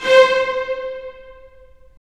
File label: STR, Roland; Roland - LA Composer vol. 4; Full Short